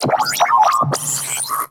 Ship Computer Terminal Chatter; Corpus Ship

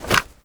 Tenno Alt Remove From Back, Foley; Warframe

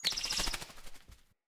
UI Default Theme Close

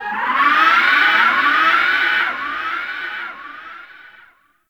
Distant Creature Shriek; Ambience; Warframe; The New War; Narmer Outpost

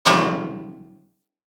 Bullet Impact Debris Pipe; Weapons

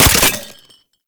Grenade Launcher Low Clip; Weapons; Scaldra

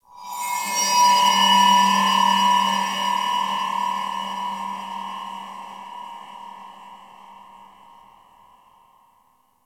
High Shimmer; Warframe; PVP; CTF Orokin; Ambience

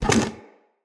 Vase Medium Slide; Vases

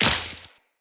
Step Veg, Footsteps, Walk; Tenno

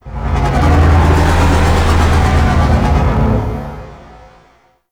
Void Dragon Vocal Pre Death; Enemies; Vocals; Warframe; Duviri

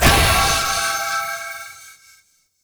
Shrine Spawn; Warframe; Duviri; Thrax Offerings

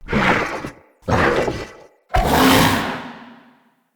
Corrupted Battle Dog Attack Spit; Animals; Vocals

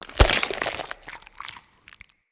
Pumpkin Destroy

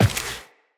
Step Default, Footsteps Walk; Tenno

